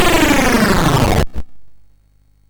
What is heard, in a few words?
sid MOS6581R2, GAMES; Catalypse